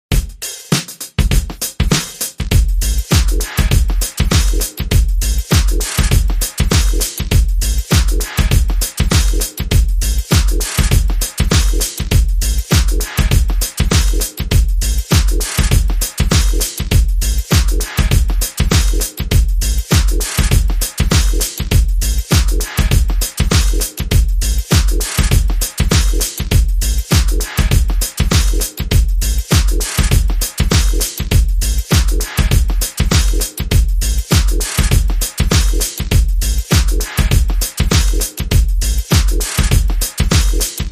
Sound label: Beat